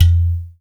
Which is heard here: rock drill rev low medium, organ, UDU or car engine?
UDU